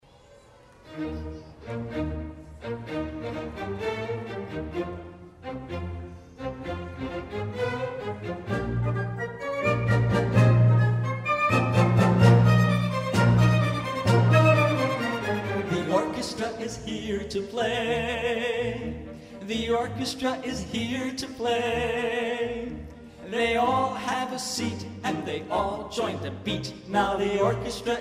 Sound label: the orchestra is here to play